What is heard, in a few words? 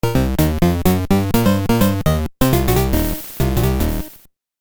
Stage Complete; DKL3